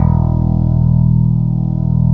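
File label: GT SYNTH, MIDI guitar; East West; Global Sounds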